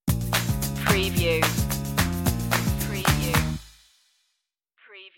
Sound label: thumbs up island stinger